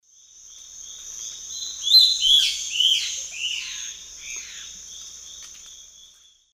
Phlegopsis erythroptera, song; S. America; Eastern Lowlands

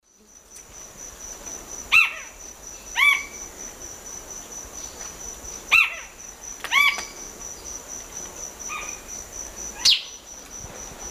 Synallaxis gujanensis, songs 2 birds; S. America; Eastern Lowlands

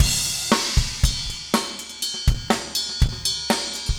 funk, 120bpm, loops

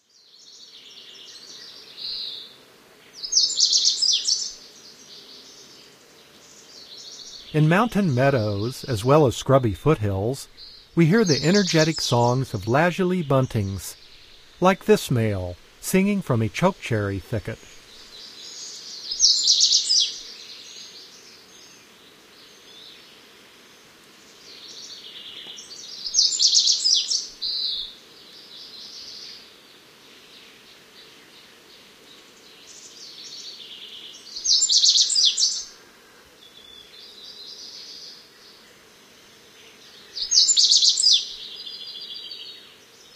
Lazuli Bunting, Bird Sounds; North America; Common Western Species; Know Your Bird Sounds

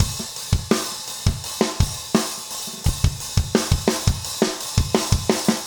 rock 85

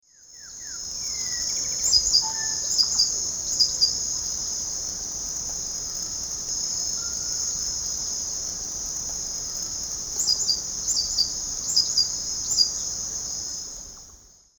Leucippus chlorocercus, calls; S. America; Eastern Lowlands

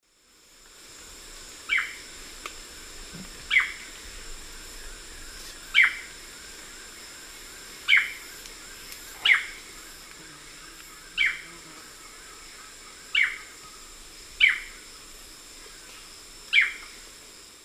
Myrmeciza melanoceps, call; S. America; Eastern Lowlands

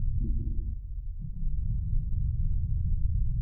Low Rumble; Dance; SFX; DSP

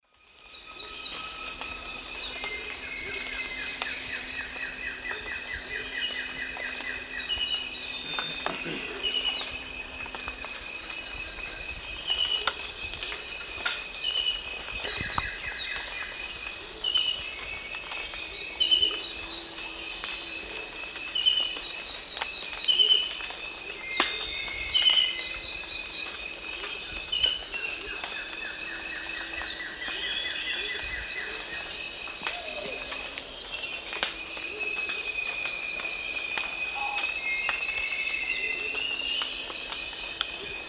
Terenotriccus erythrurus, song; S. America; Eastern Lowlands

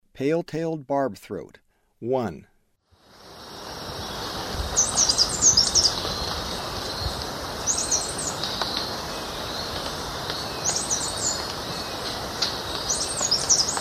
Pale tailed Barbthroat; S. America; Eastern Lowlands

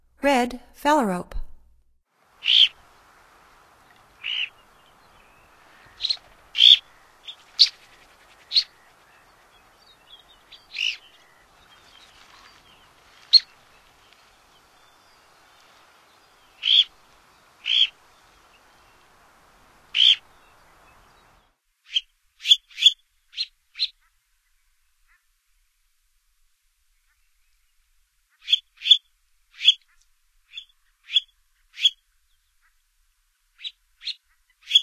Red Phalarope; North America; Stokes

